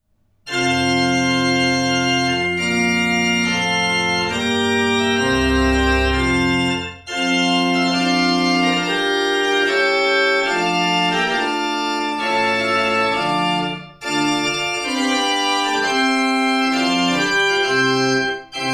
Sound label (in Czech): ukazky positiv